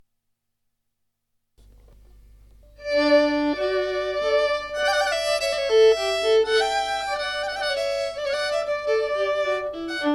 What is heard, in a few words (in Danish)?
Menuet